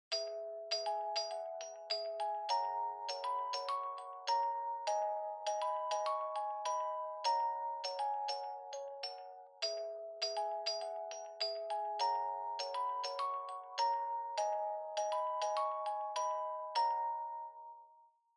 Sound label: Smartphone Ringtone Speaker